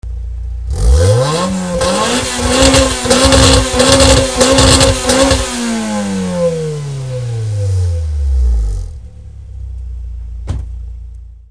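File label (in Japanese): HKS dr, 111LEVIN+HKSドラッガー レーシング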